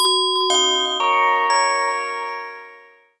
Tone, Message; LG; KE970-Shine

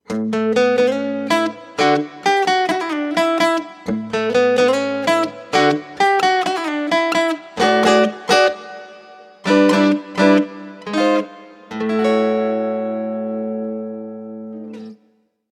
Old Guitar, ringtones; KONKA; SP6

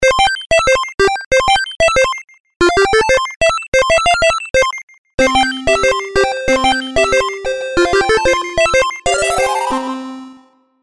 Jumping Pixels Ringtone, 8-Bit; HTC

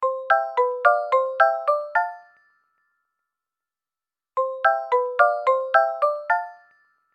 Glassbead; Itel; Power 80

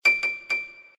Low Battery; Doov

